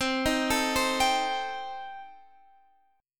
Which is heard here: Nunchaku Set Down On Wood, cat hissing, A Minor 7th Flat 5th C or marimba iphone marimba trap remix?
A Minor 7th Flat 5th C